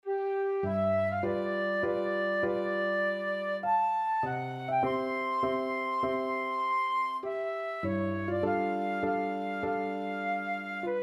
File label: chopin prelude a flute